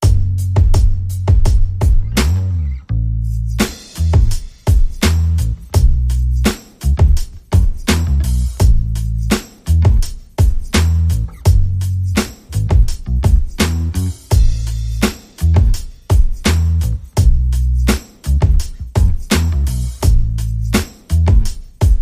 Drum and Bass

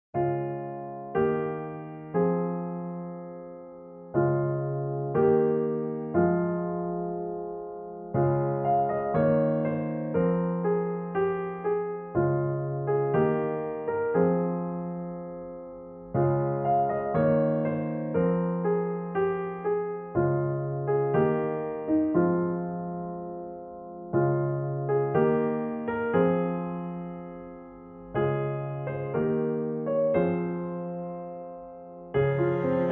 Piano Strings

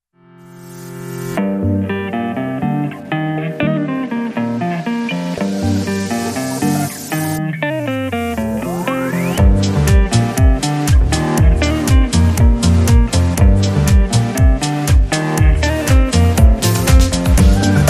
Modern Guitar Beat

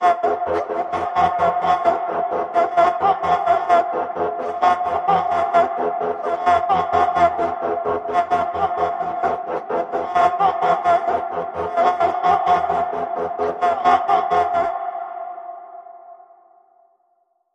Outer Realms Peril Pulse

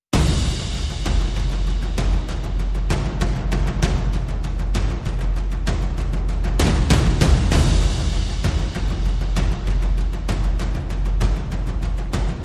Happenstance Percussion Only

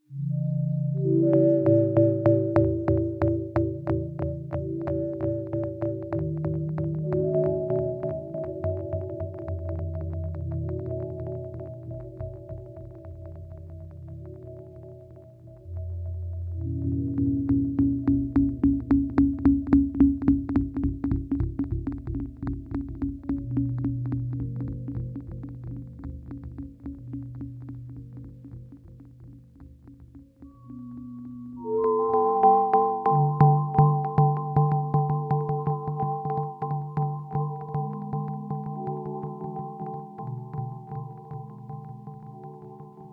Pulses

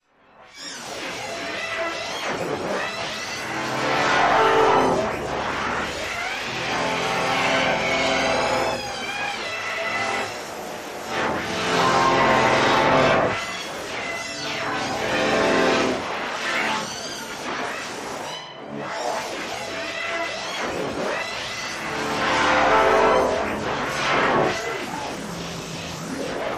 Time Travel Musical Pulsers

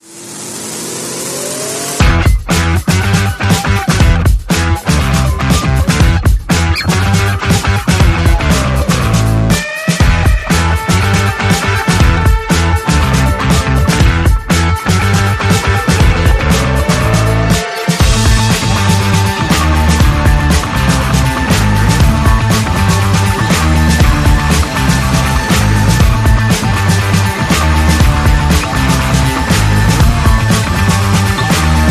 No Whistle

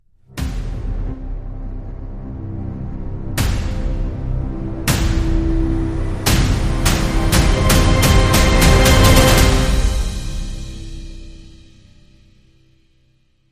Dramatic Sting

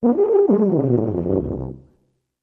Tuba Gliss Up and Down

